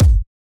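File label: Drumloops Beat 128 BPM Solo bass drum; VEC5 Multi Loops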